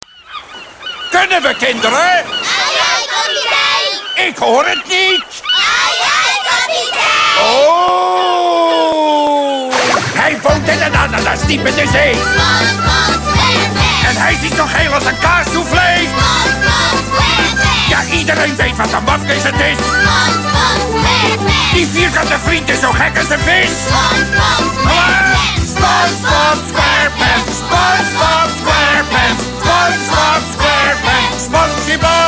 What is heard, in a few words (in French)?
generique hollandais; bob leponge